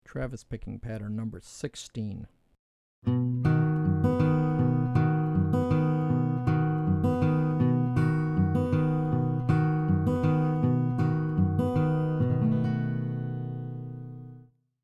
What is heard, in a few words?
Travis Patterns; travis style lesson materials